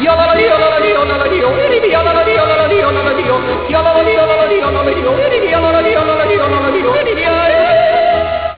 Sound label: yodel